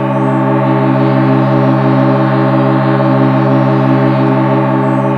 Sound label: ANS Chord, Noises; Synthetic's Serum Collection; Ronny J Noises